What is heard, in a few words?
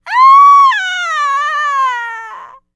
Enemy Generic Human Death Falling